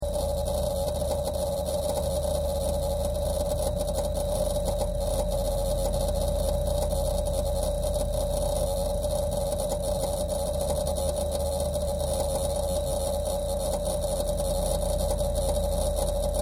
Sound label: SIGN ELECTIC FAULTY BUZZ LOOP, Faulty eletrical sign; Miscellaneous Electronic